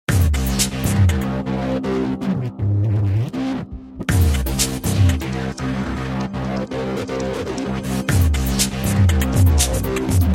Epic Cinematic Electronic Futuristic Action Movie Theme